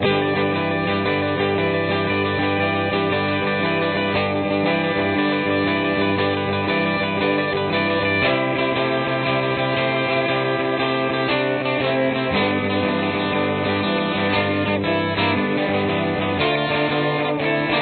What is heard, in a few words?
solo one, guitar